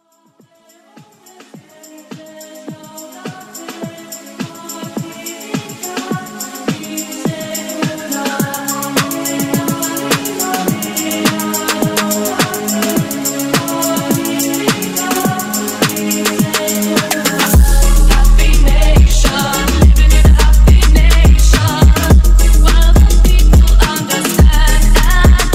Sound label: phonk